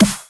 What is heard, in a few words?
Dirty Snare